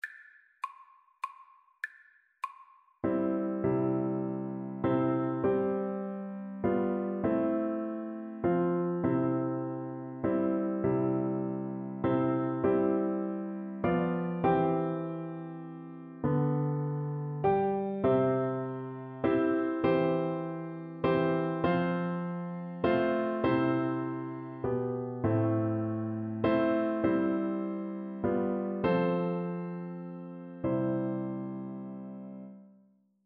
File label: Oboe